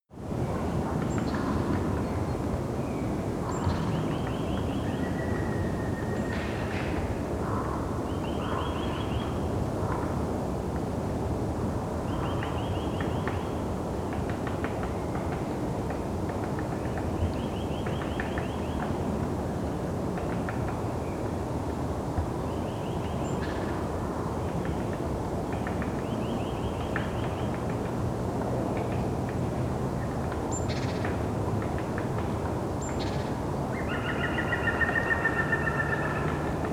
forest birds woodpecker distant

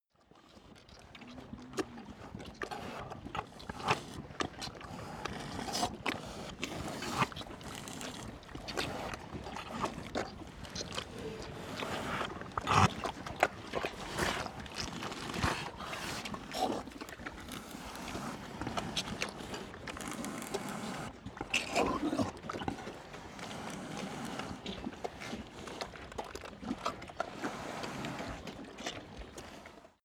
pig herd eat long

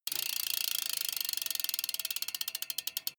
bmx bike freewheel push and slow down short

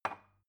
glass put down cupboard soft

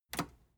metal button robust push disable

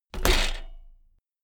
bmx bike land impact rattle

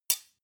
sword hit clack soft

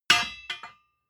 hammer hit steel clank

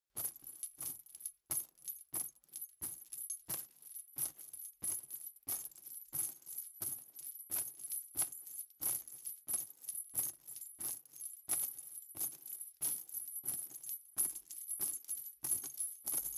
troops metal chain rustle walk

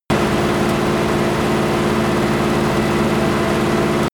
tank medium engine medium speed steady

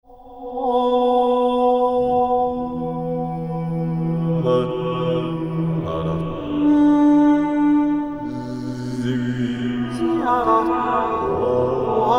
like monks

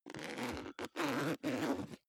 zipper backpack open unstable

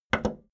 video tape player plastic play click fast

